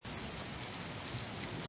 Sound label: Rain Stereo Loop Short, Natural rain loop, short version. Seamlessly looping sound.; Weather